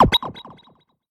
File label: button press; DRV3